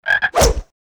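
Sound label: war attack, Mob; DEMOLIS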